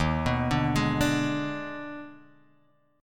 D sharp Major 7th Suspended 4th Sharp 5th, Guitar, Drop D